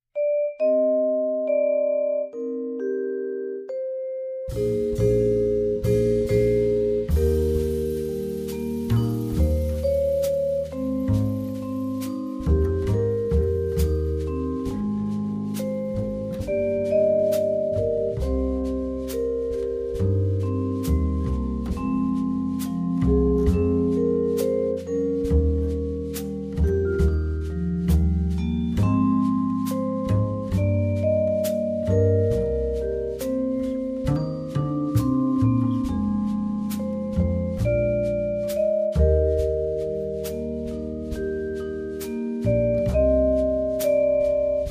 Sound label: Vibraphone